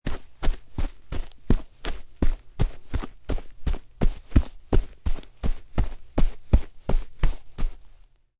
Footsteps Run On Dirt, Multiple footsteps of heavy person running on dirt; earth, wearing boots; Footstep Sound Effects; LynneMusic